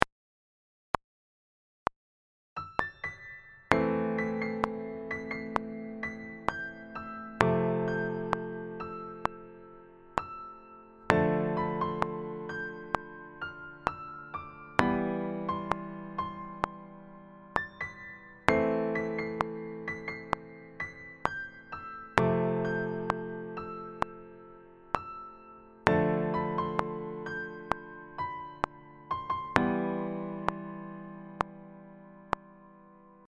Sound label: melody